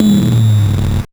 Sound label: Noise, 8-bit, Bitty Noises